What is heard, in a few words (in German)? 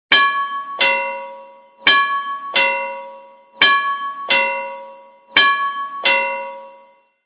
Stationsglocke